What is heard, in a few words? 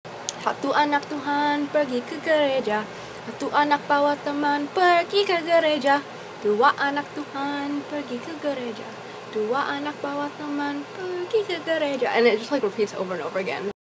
Indonesian Church Song